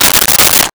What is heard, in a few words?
Box of Push Pins Opened; Office Supplies; 1001 Sound Effects